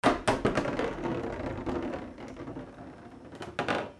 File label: FRICTION